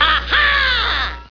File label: ahhaa